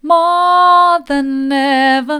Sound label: more than ever mid, vocal